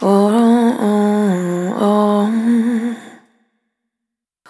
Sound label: Spin, Single Voice Melodic Loops